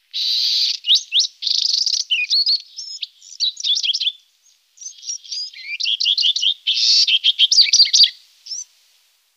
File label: Be Wren juv